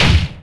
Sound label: boat hit; Splashdown; XBOX; Impact